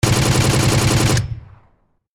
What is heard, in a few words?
Machine gun burst tank turret